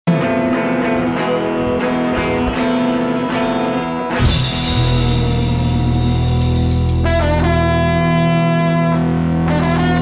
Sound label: Ska Band Live Broadcast Mix; Live At Froggy Daze; WKZE